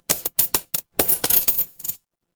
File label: impact coins